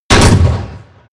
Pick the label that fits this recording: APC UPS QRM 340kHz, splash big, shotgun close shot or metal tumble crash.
shotgun close shot